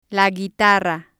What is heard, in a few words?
guitar